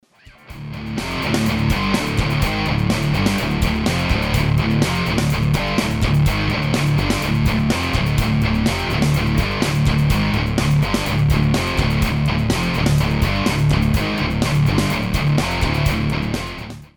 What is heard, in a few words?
Metal riff tonelab st; gitar